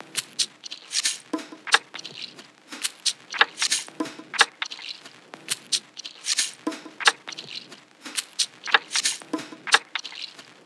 Neck Snap Perc Loop - 90 BPM; Drum Loops

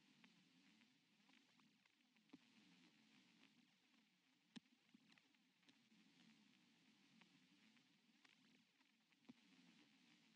80 BPM A# Min Noise, Lofi; Melodies; Loop Stems; Cymatics; GHOST (Beta Pack); Away Again

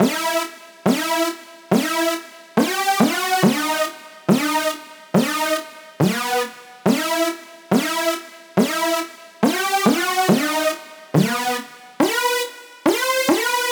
Riddim Melodic Loop - E 140; Lasers; Ghosthack; Riddim Essentials Volume 2

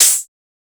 Open Hihat, Techno Drum Shots; Drum One-Shots; GhostHack; Ghosthack - Free Techno Drum Shots 2024

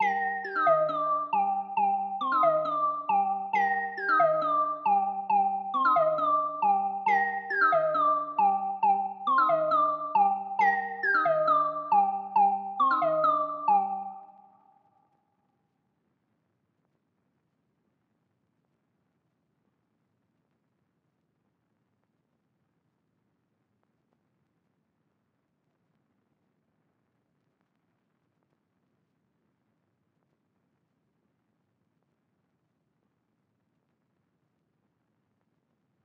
136 BPM G Min Lead; Melody; Trap; Loop Stems; Cymatics; 2022 Melody Collection - Trap Pt 1; Buss Down

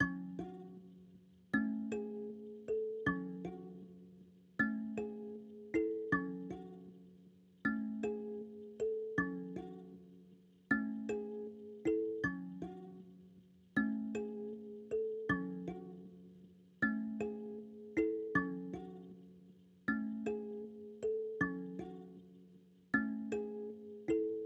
157 BPM F# Min Bells; Melodies; Loop Stems; Cymatics; Pharaoh Premium Drum Samples (BETA); Valley of the Kings

